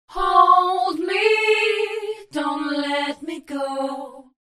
Chorus Thickener Chorus Vocals Wet; Vox Quantizer; syntheway

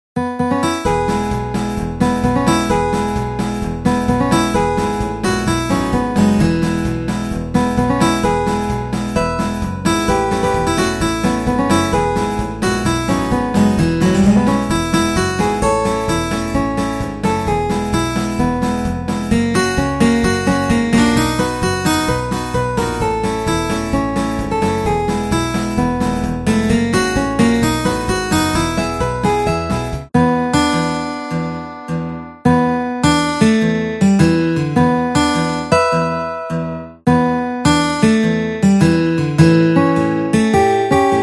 Steel String Acoustic Guitar; GuitarTempus; Syntheway

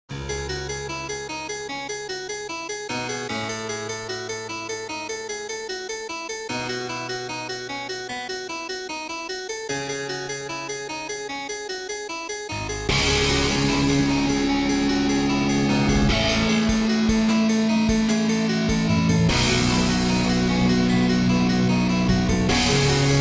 Harpsichord; Black Diamond; RetroMagix Harpsichord

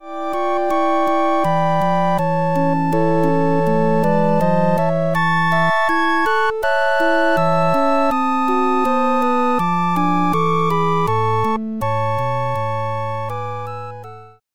Piccolo Feeling; Harmodion

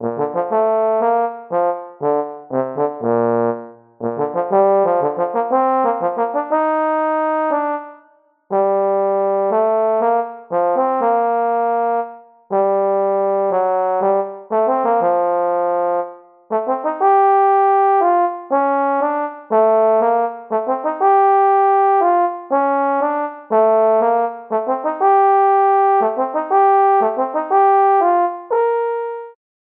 Trombone Legato; Aeternus Brass; Syntheway

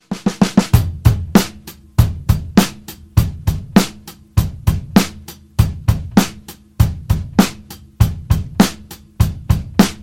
Phaser Ethereal Phase Shift Drums Dry; Chromacycle; Syntheway

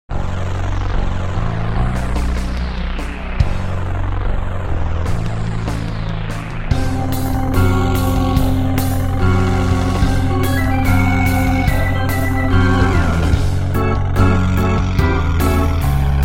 Hammond B3; Std Bright Fast Jazz Slow Low Slow; Picked Moog System; Master Hammond B3; Bassesland; Syntheway

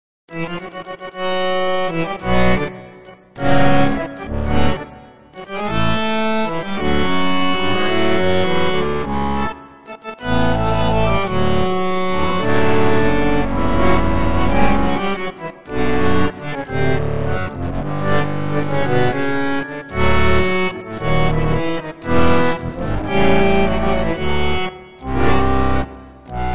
Bandoneon; Syntheway Harmodion

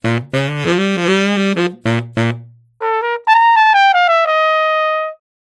Baritone Saxophone Flugelhorn Dry; Chamber Reverb; Echo Chamber; Echo Chamber is a stereo reverb and delay plug-in; Syntheway

